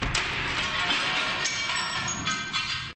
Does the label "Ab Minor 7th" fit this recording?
No